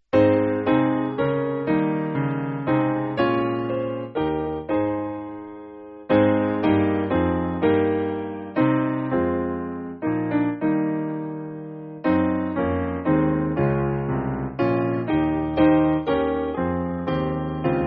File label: Nature With Open Piano